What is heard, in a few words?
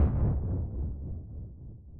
moto